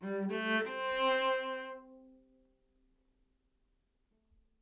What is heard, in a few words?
live string phrases, string phrase Cmaj 100bpm